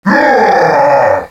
gawwwww; DS; ML BOWSERS INSIDE

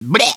bleh; DS; ML BOWSERS INSIDE; Luigi

